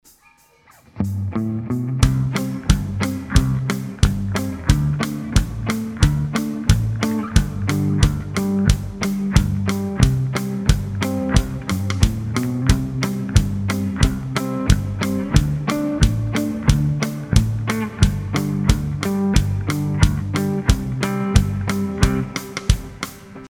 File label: Backing Track; country guitar mastery